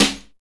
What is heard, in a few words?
original snare f sharp key, snare drum tuned to the F# note. Loudest frequency: 3168Hz